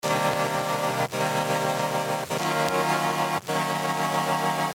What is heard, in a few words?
Vinyl Organ Vox; guitar